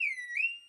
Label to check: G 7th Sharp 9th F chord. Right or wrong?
Wrong